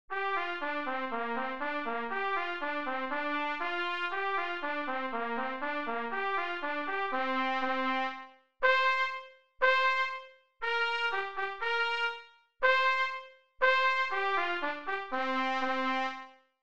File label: trumpet